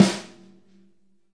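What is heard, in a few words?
snare A3, multisampled real kit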